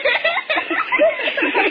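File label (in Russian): хихиканье детское коллективное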